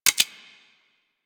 CY Xbow 2D Mech B; Tenno

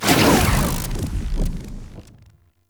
Interface Close; Warframe; Tau; Somatic Bearer

